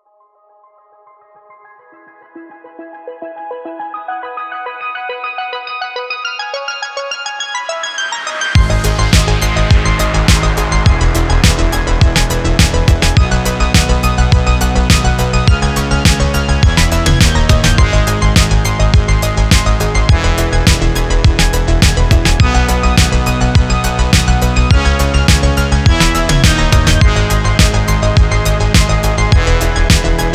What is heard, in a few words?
space